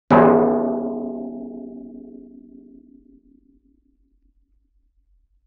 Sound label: Oil Drum Hit; Vintage Cartoon; Drums & Percussion